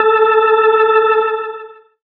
Organ Note; Jungle; Zero-G Jungle Warfare 1, 2 & 3; 90 s Sample CDs

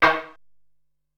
Steam Drum, Ensoniq SQ-R Plus

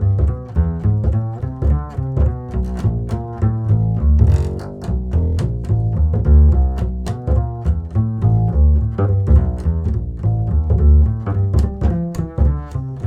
WALK G, Bass; Spectrasonics; Bass Legends

